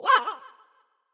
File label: Bark Damage; Super Mombo Quest